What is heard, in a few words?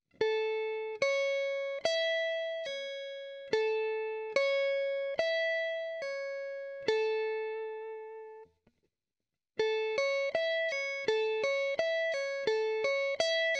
Sweep Lick A Major 2-String